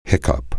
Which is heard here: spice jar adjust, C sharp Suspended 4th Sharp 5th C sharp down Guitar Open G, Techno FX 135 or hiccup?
hiccup